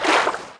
WATERS; lander

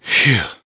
phew; heretic ii demo; player

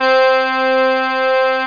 Tango Accordion (C3); Return To Zork

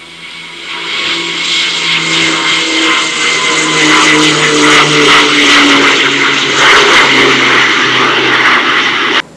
fighter, Transpor; Sound Library 2000; Wayzata Technology

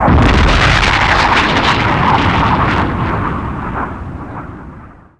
Cistern Explosion; Red Shark

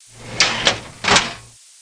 File Cab; Florida State Football - Ultimate Multimedia Experience

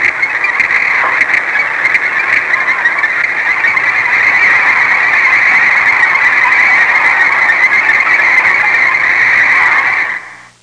SEAGULL; Sound Effects for Multimedia - Volume 1; birds

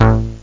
solo bass; Atari Mega Archive

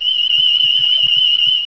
Ground Tricorder; Star Trek JR Audio

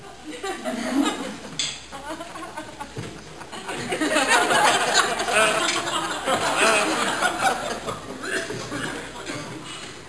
Giggles and Chuckles; Soundsation; Human

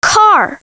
car

